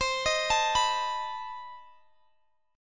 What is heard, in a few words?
B 7th C; B7/C; arpeggiated